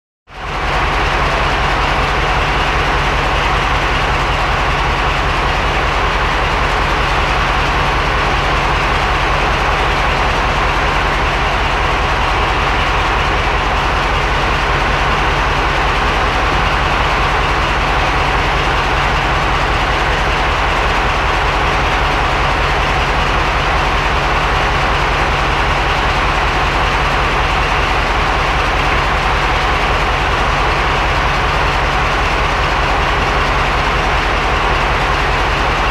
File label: Idle Diesel Train